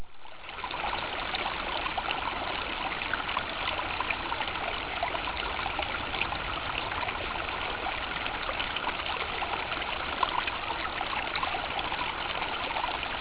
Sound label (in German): wasser